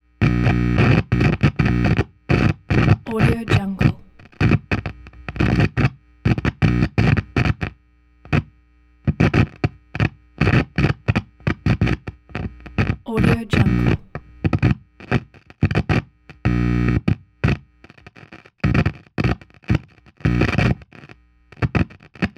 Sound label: guitar amp static plug